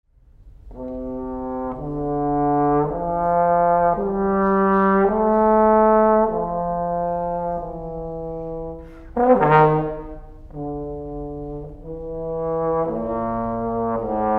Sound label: square pegs trombone